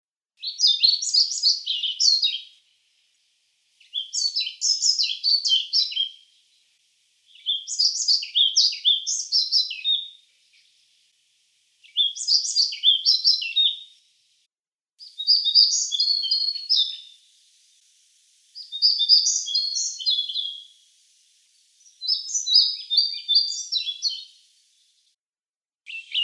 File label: Ficedula hypoleuca